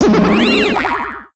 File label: mono appears